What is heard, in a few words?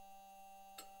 mic, mars2020 edlcam